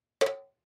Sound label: Bang